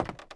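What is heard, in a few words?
creak walk, footsteps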